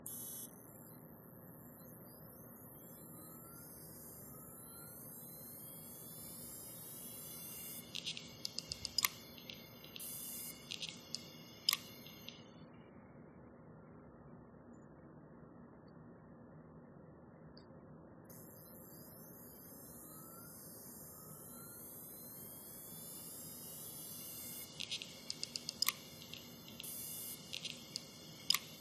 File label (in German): Western Digital NAS Festplatte klickt